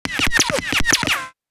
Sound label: sfx skill, ZombieSkill SFX; IdleRPG2024